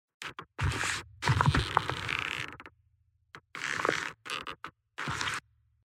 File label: grabbing